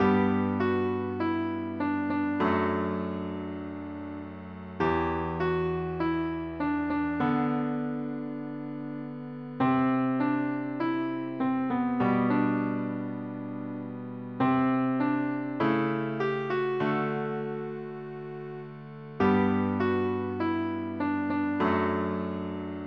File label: piano; normal tempo; PlayScore